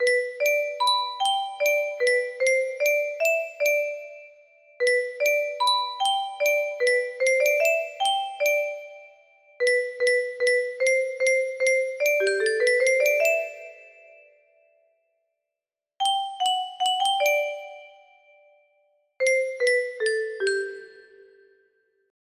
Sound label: music box melody